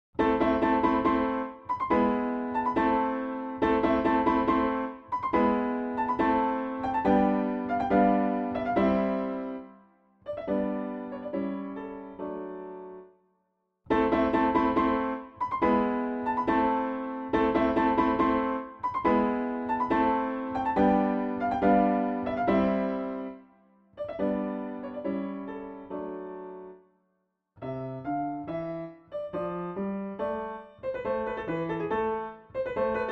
Piano

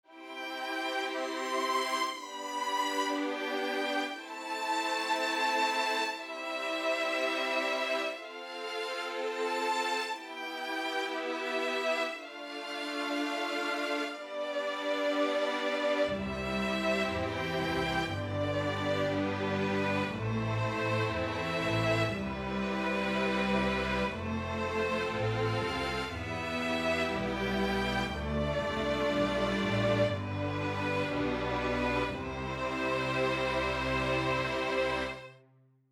Chamber Piece

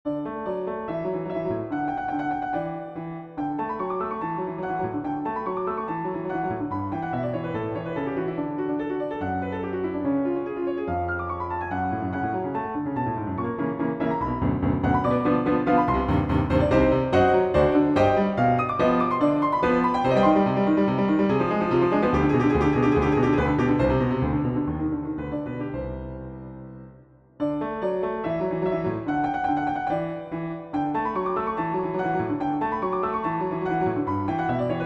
piano miniature

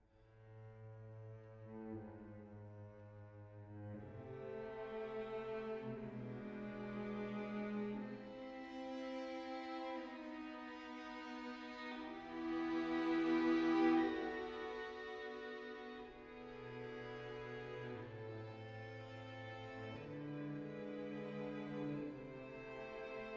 short string quartet exercise

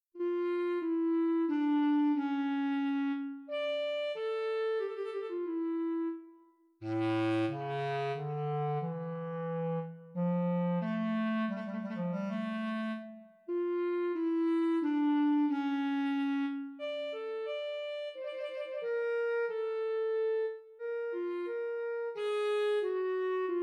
Soliloquy for Bass Clarinet

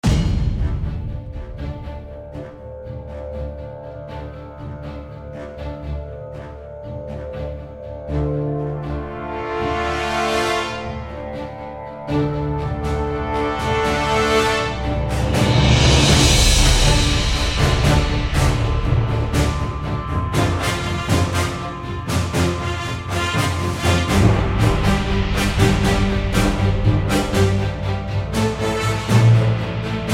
Boss Battle

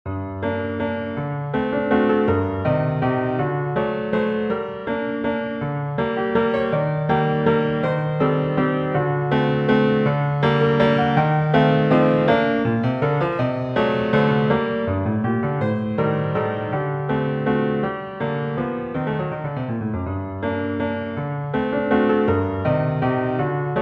Waltz in G minor

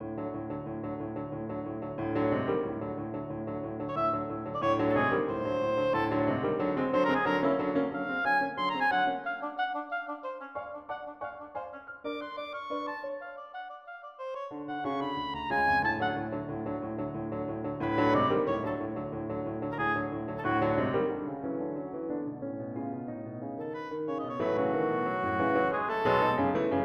Oboe Sonata; III. Masque of the Grasshoppers

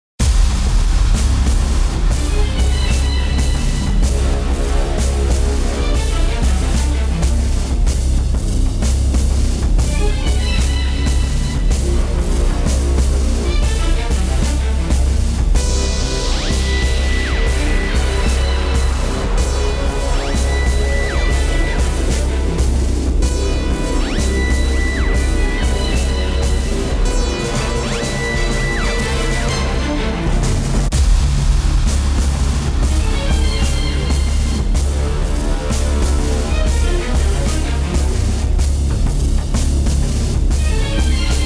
Closing Credits

